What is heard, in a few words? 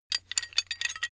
metal handling pieces